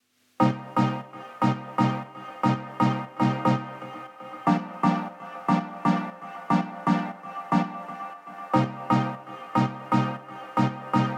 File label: 118 BPM G Min Synth Chords; Melody Loops; Loop Stems; Cymatics; Pacha